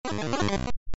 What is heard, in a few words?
light fire; adarkroom